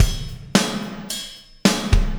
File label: Beat, Processed Drumkits